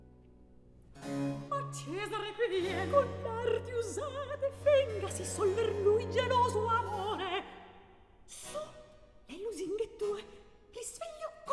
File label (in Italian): Recitativo; Atto I Scena 2; Cleonilla, Caio; Antonio Vivaldi; Ottone in villa (2010)